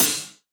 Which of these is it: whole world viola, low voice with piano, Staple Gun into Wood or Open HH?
Open HH